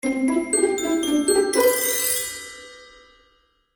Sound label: Magical Music Finale, Cartoon Music Sound Effects